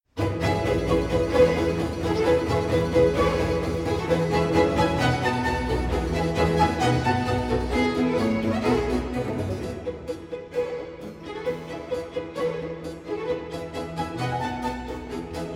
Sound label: Sinfonia F Major, Allegro assai; Jiranek